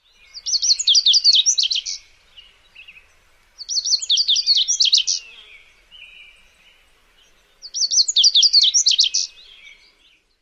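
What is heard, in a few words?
song, bird; CA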